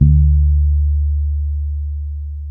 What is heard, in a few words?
bass, D 3; Spectrasonics; Bass Legends CD 1